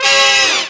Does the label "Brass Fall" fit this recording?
Yes